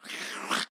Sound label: Attack; Beholder